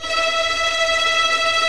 TREM VIOLINS

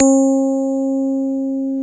KEY EP3 B 2, Digital EP's

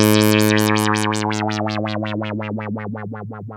2600 A2, ARP 2600; Hollywood Edge - Giorgio Moroder Rare Synthesizer Collection

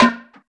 hi-percussion, techno; Techno ID; single-drums

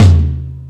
Dirty Tom, Tom One-Shots; KJ Sawka - Drum n Bass & Beyond; Drum Tool Box

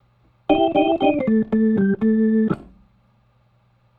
Organ, 70s Analog Funk; Sonic Foundry (Sony Creative Software); What it Is! 70s Analog Funk

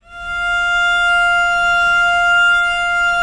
VL F4, VIOLE, Historical Instruments; USB Soundscan vol.23

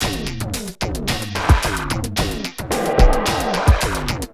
Beat, Industrial

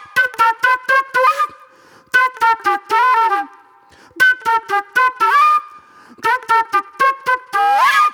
Old Soul Flute; Sonic Foundry (Sony Creative Software); Manhattan Flutes; Good Times

